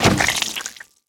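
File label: zombie body impact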